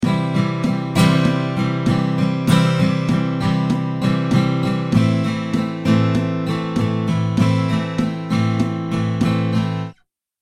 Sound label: strumming pattern